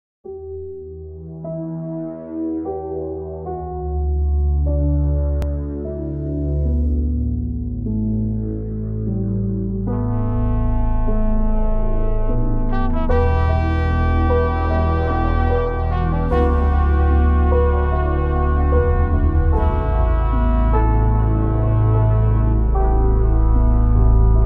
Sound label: slowed; half life 2